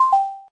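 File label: mail, ringtone; animal crossing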